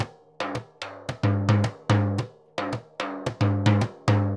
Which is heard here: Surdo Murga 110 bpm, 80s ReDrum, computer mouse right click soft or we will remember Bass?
Surdo Murga 110 bpm